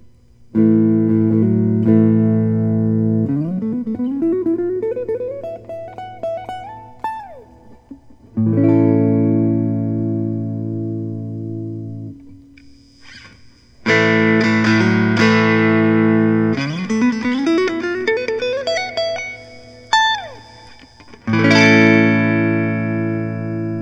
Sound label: fender telecaster